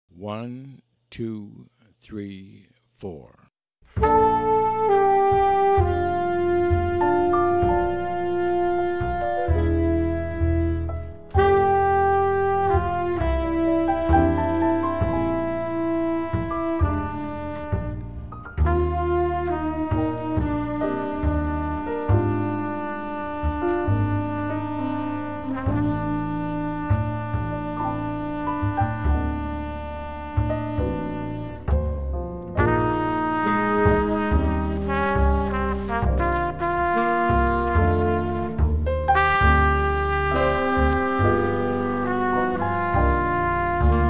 minus Drums, This laid-back, lyrical song was recorded as a slow bossa; sticks throughout on the recording, but brushes could also be used; count off sets up the melody